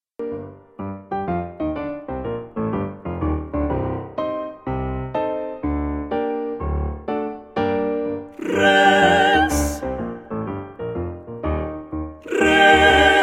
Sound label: Rex tremendae alla